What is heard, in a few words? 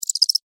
Cricket Chirp, Cricket, Chirp, Single, Insect, Bug; Sound Effects:Animals:Insects; Crickets; Blastwave